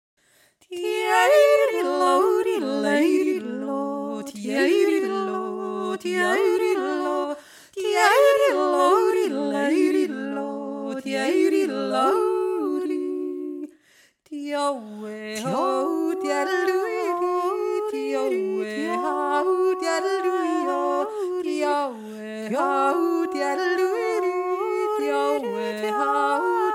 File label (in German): jodl dai dai